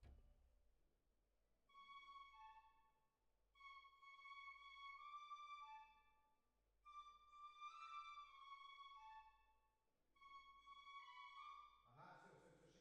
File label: Cbasses